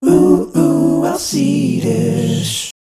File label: Ooh ooh